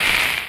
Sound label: Ranged Magical Light; Kilta